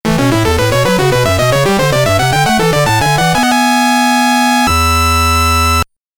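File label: All Clear, all stage clear BGM; Dracula Densetsu II; GB; オールステージクリアBGM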